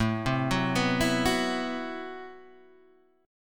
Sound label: A Minor 6th Add 9th A Guitar Open G